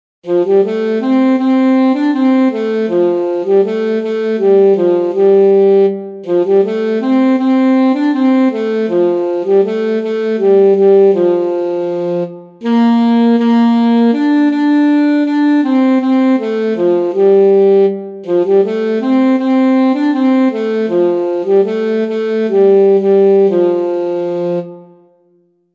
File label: alto saxophone; oh susanna